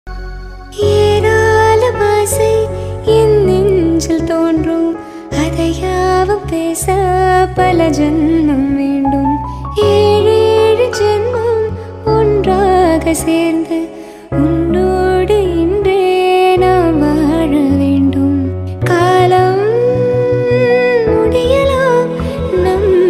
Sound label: Female Version